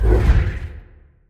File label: Explosion Ghost; KriptoFX; Realistic Effects Pack v1